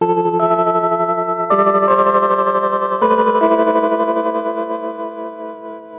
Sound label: Classic Melody Loop 160 BPM A Min Synth; Loop Stems; Cymatics; 2020 Melody Collection